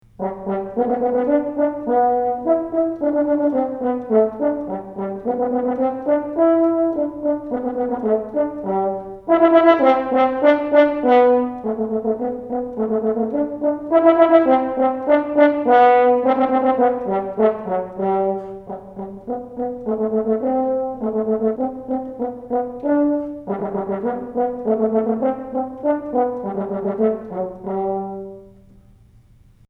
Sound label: French Horn Junior High